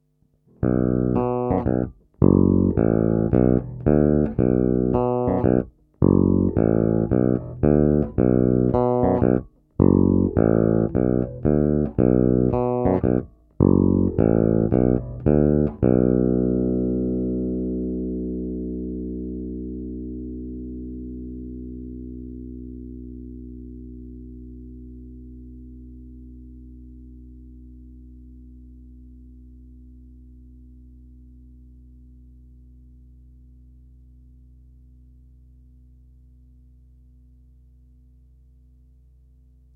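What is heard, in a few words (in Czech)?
Kobylkový snímač, basa; Vlček Basses Fineso 5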